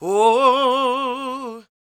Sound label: SING, Vocal Planet Blues & Soul; CW SOUL